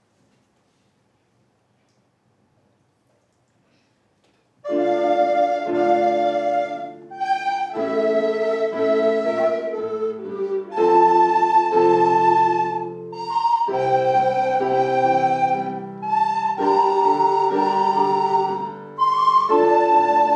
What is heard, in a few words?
Sarabanda; bandes sonores